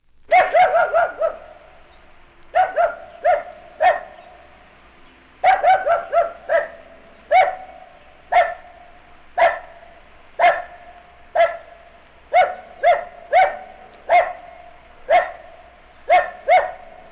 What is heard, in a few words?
Dog Barking Puppy